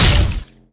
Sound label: shoot mech, strider; creatures